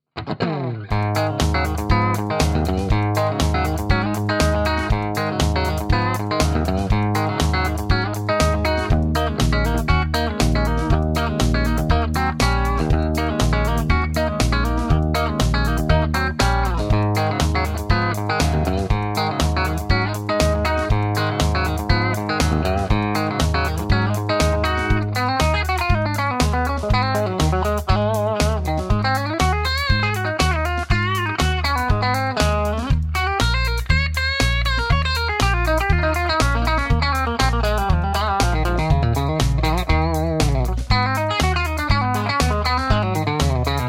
clean bridge, Tele; schwarzbach; VP-Tele-Pre55

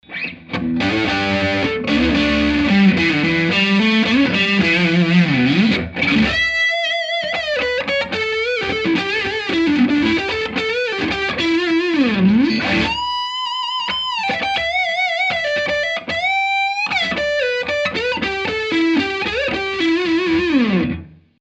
overwound middle neck; Stratocaster; schwarzbach

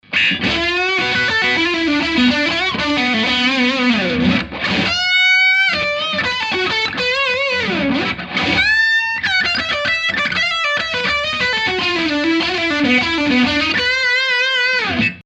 brett neck middle, Strat; schwarzbach; VP-Strat-56

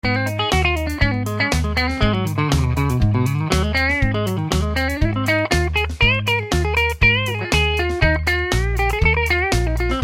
clean bridge neck, Tele; schwarzbach; VP-Tele-57

